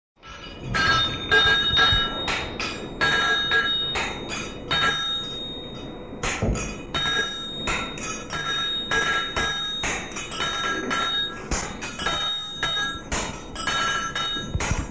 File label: Blacksmith's shop; SOUND EFFECTS1